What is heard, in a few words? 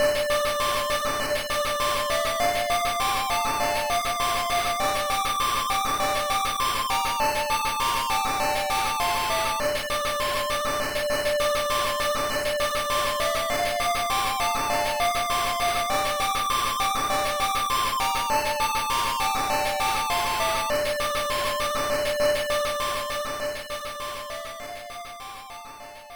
Fight; Evander Holyfield's Real Deal Boxing; Game Gear